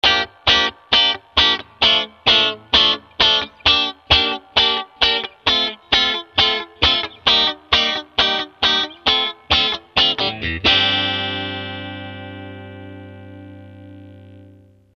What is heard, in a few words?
Clean Strat; compressor pedal; Tangerine Squeeze